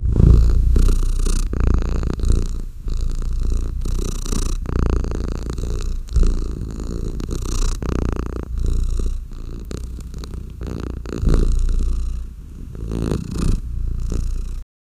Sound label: the cat is purring